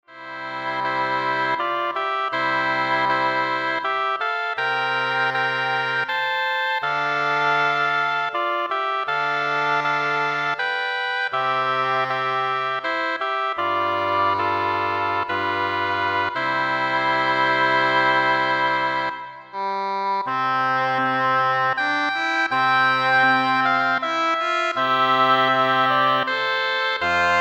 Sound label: Double Reed Ensemble